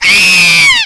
Death; Apeiron; Groucho

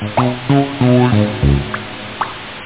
Analyze Noise; QuadraComposer

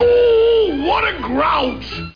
grouch

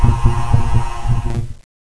Sweeper pass; Prometheus 1.0

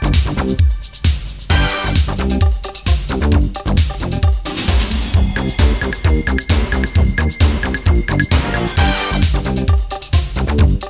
Music Loop; Chiral

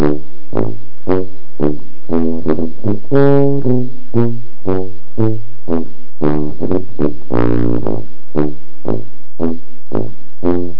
tuba; The Epic Interactive Encyclopedia; Epic Marketing

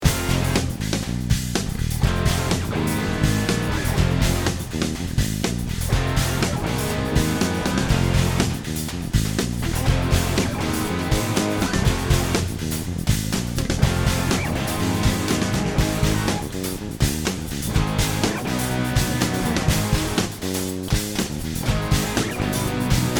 Sound Music; I-SPY Press Kit

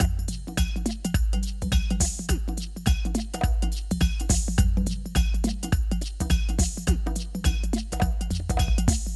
Jungle Drums; syntonic generator

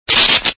electroc; mecha cat destructo

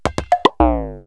bonks pop, cartoon accents; KABOOM! - Wild and Wacky Sound Software

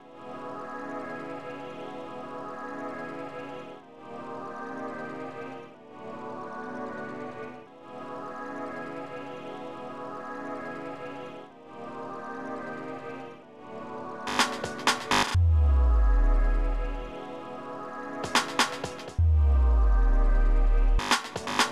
Tiny Trance

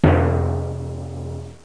timpani; Music Game (1994)(Microforum)

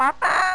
chick

